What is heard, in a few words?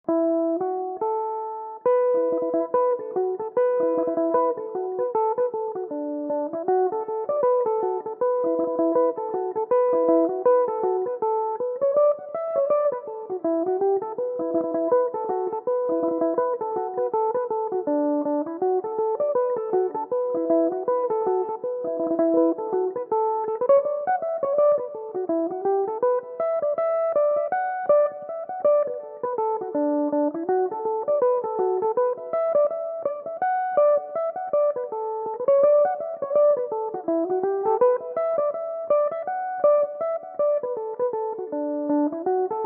irish mandolin, tunes